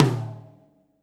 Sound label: TOM, Drums Acoustic; USB Soundscan vol.10; AKAI; 90s sample CD